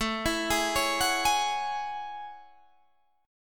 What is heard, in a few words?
A Major 13th, Guitar DADGAD